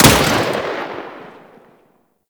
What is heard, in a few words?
Spark Rifle Shot; Grineer